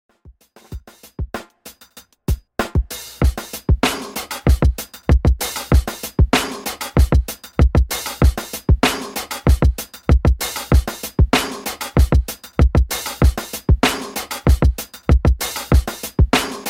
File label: In Outro Redrum 96 Bpm